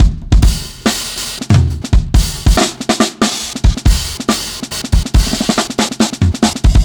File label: Brown Half-Groove 4-Bar, Breakbeats, Drum n Bass; KJ Sawka; Drum n Bass & Beyond